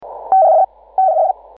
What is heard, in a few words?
burst; 10007khs